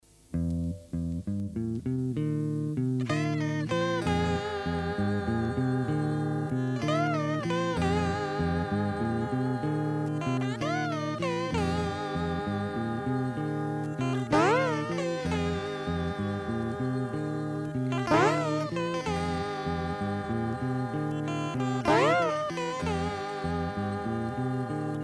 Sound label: blues robot; matula records